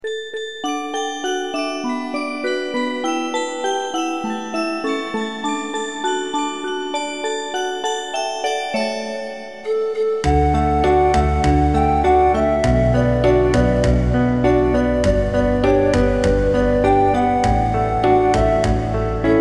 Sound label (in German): kinderlieder playback